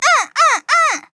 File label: Grunt Attack